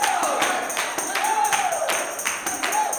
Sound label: 160 TAMB BRK; Blues & Soul